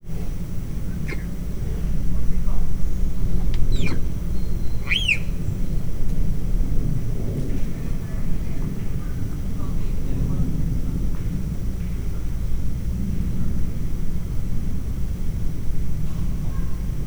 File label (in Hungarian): három hónapos kölyök csipogása, leopárd; miskolci zoo 2009